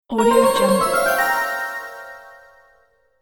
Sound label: mystical egyptian adventure